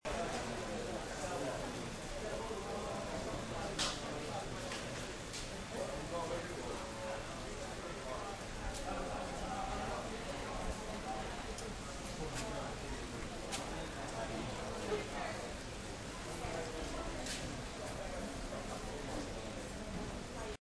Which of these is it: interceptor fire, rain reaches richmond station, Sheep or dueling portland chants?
rain reaches richmond station